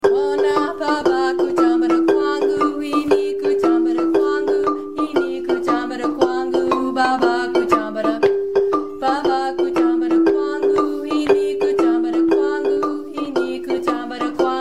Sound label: harmony line, marimba; kare mugomba